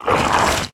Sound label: Monster Zombie type Attack